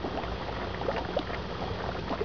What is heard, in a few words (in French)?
plouf